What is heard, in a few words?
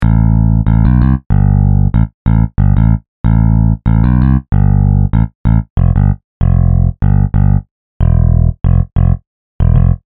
Bass